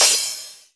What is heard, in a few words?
Dance Drums crash L; Dance Mega Drums; Best Service; CRASHES